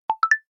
Distinct, notifications; Coolpad 1827

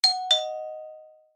Door Bell; Itel Power 80

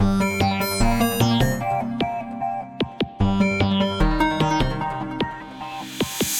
Electro, ringtones; MobiWire HomePhone 4G

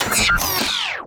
notifications; RED; Hydrogen-One

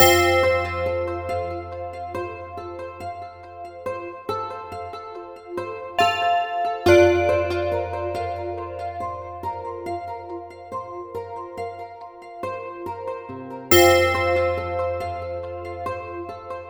Bell; LeEco; Le-2

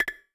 Voice Record off; Doov A8

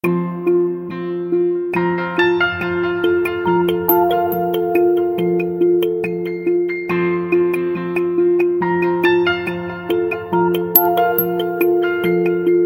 Piano, alarms; BQ 5731L-Magic-S; BQ Russia

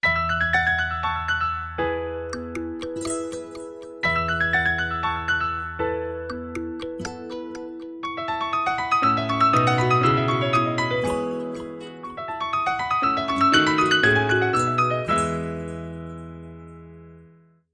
Young Memory, ringtones; Honor Pad X7

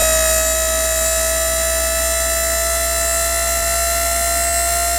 Camera Zoom In; Samsung SCH-A915

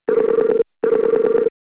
outgoing Call; Netgear SPH101-Skype-WiFi-Phone

